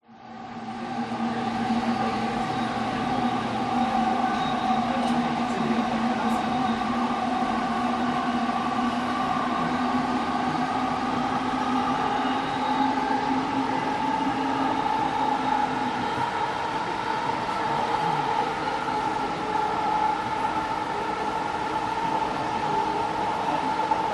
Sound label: train station ambience sky train jfk airport train noise constant distant voices